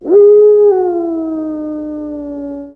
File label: awoooooo; p4u; Koromaru